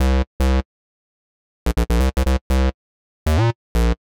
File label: Synth Bass C; PowerFX - Nu Jazz House